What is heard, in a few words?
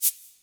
shaker, Drums, Kurzweil K2000